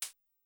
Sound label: Cym; Sonic-Potions LXR; Chip